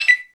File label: Dialog Button Click; Custom UI Sounds; Fallout New Vegas